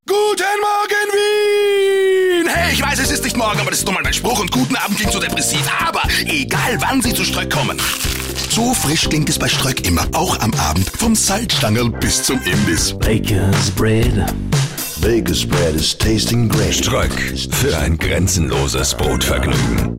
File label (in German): Feierabend Musik, Radiospots; „griffig & glatt“ – Kunden-Magazin Radiospots; stroeck